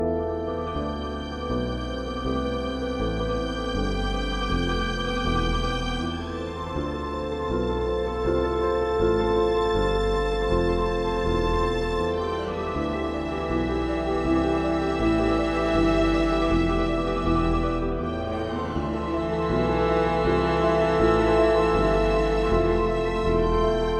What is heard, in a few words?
Steelworks Slow; rive wreck hack die retry; ps4